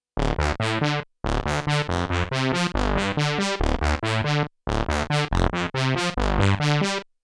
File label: AMS dmx 15 80S Prophet harmony